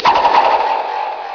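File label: throw; Scorpion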